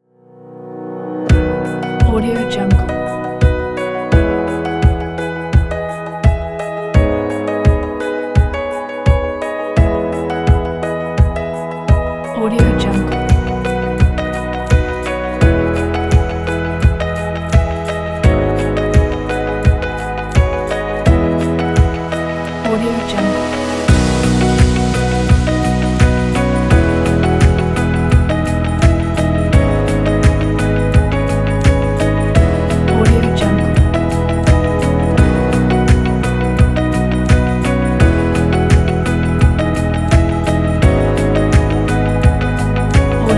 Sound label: slow inspirational corporate